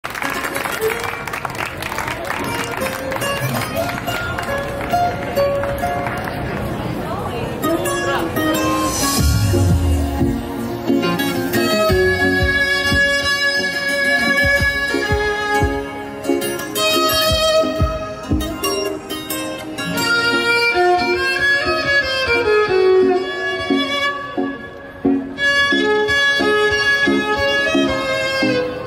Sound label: sax e violin